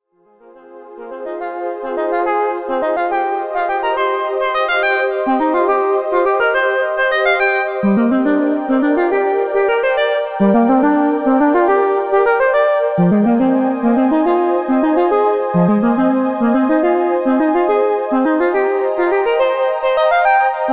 OSCar Spezial Wave

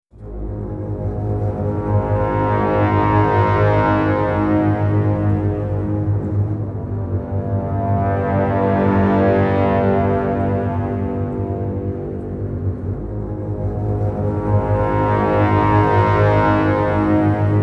Multi Acid

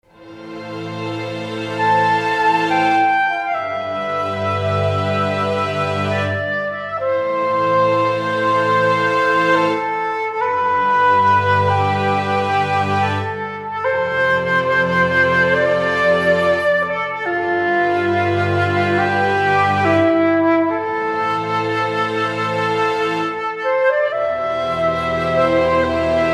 Ensemble Legato

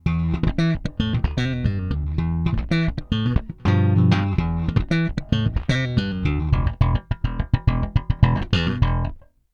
Neck middle parallel

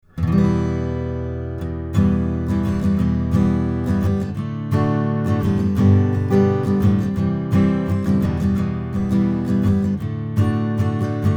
API No EQ Ribbon Acoustic Guitar too close

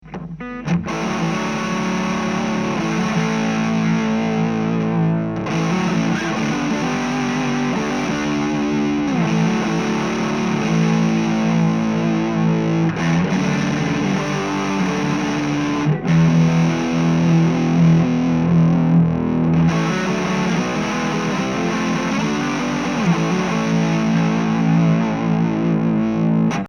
vox gain full boost

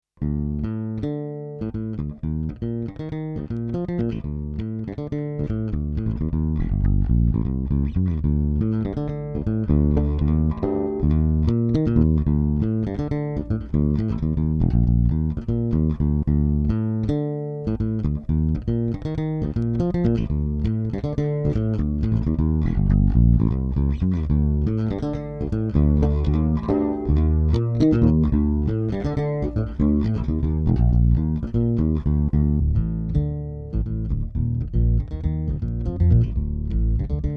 BH-550 Passive J Finger

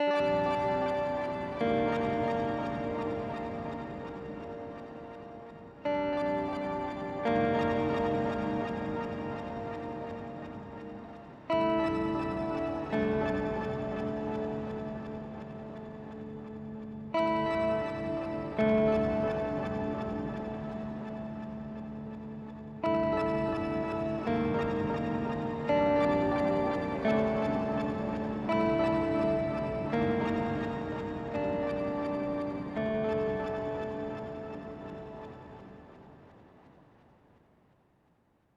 Meris Polymoon Modulation Both

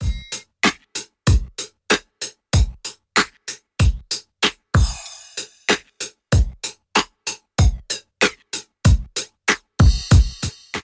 Jet

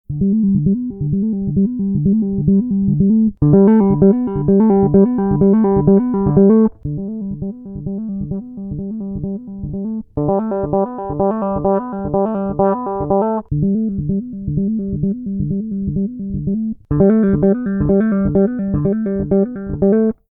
Spector

